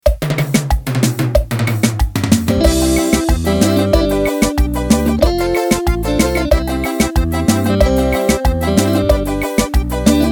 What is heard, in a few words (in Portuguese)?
arrasta forro